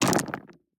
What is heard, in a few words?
DICE on Neoprene, Throw and Roll, Standard, 16 Sixteen Dice; Samples; ArticulatedSounds; DICE Sfx Party (Extended)